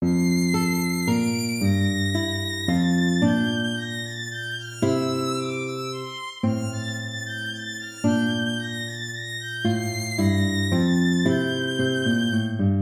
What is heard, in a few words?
minuet major